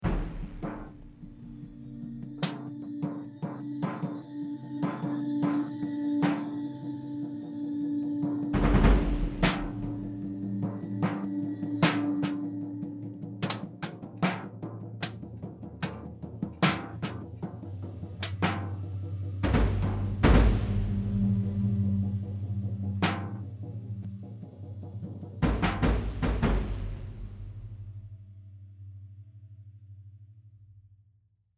Drums Metal Harp Hits

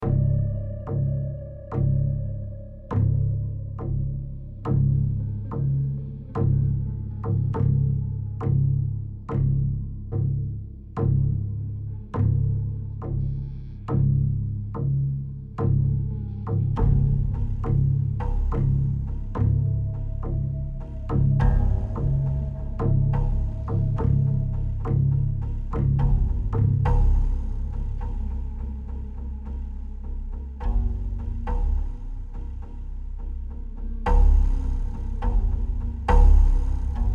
Tension; Tension Lab 2 Loop Alternate

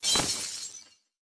Ice; The Denpa Men; Android

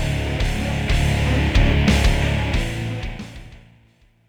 Forest End Fighting; bright memory mobile

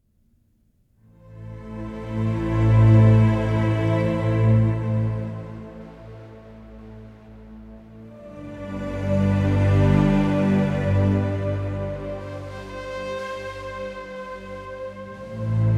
String Loop; Balthazar's Dream; Dustin Is Taken

